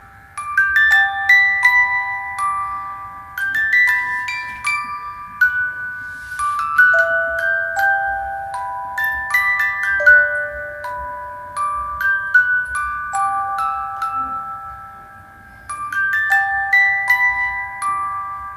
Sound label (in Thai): กล่องดนตรี